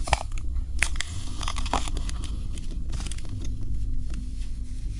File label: book